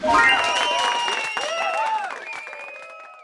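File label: Ambience Partymode Crowd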